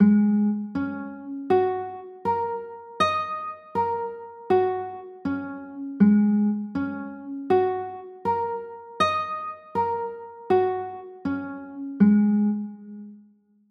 a flat 6; a flat 11

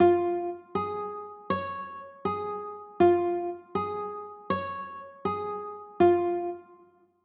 b flat m, chords; b flat m7

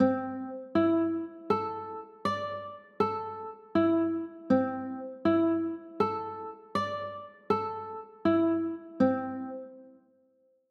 c 6 9, chords

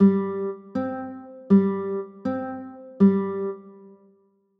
c 6, chords

